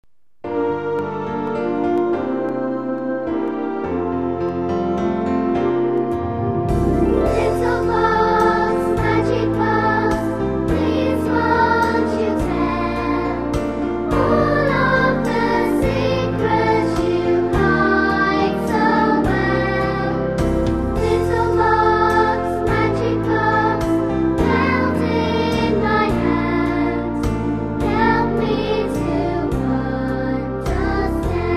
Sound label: Magic Box